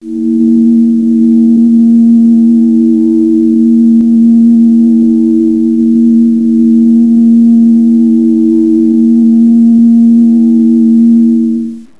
kids; terminus

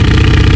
petrol

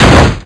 hit; io tracks circuit; motorsportsland

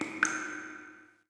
kids

